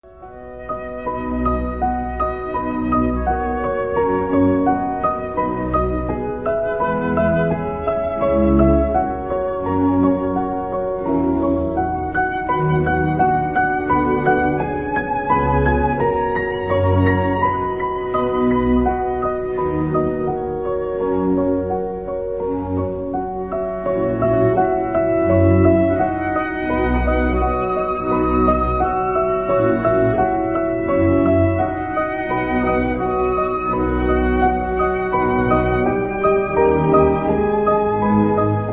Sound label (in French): concerto pour piano, adagio; BEETHOVEN; n5 Empereur